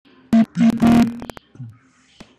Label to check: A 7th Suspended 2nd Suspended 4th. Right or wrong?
Wrong